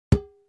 balloon; Golden Crayon Sounds

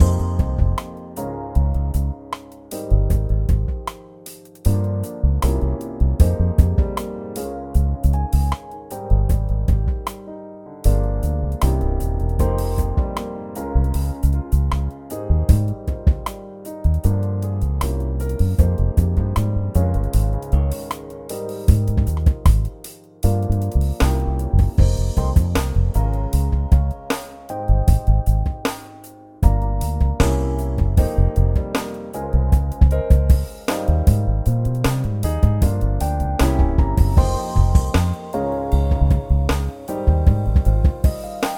background; RS Games Sounds

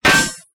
bomb hit; Original TK Sounds

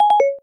no match, iOS Sounds

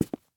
marble step; Golden Crayon Sounds

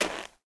snow step; Golden Crayon Sounds

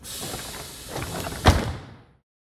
door close; Golden Crayon Sounds

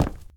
concrete land; Golden Crayon Sounds

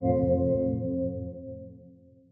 Shutter Sound; Intelligent Shutter Sound; iOS Sounds

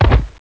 Wrap Forward; ESAN VO Sound Schemes; ESAN MK Sound Packs

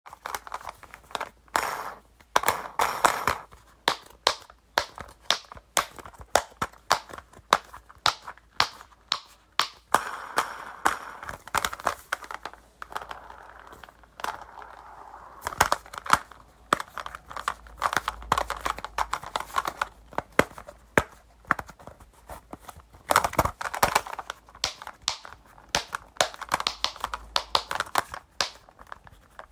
fidget dimple spinner; Golden Crayon Sounds

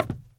shelter step; Original TK Sounds

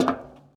bleacher step; Golden Crayon Sounds

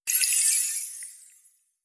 screen Curtain On; NVDA; NVDA Ericson Sound Theme

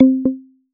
Indentation, macOS VoiceOver Sounds

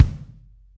drumset c; Golden Crayon Sounds

